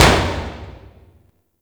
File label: Destruction Short Metal Hits; Ambience; Warframe; Corpus Railjack